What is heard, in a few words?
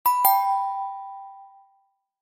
quiz right